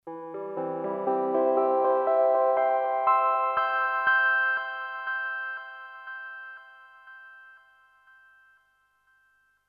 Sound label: ringtone